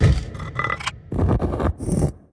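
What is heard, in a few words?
free Fall Lock Sound; netent; eldorado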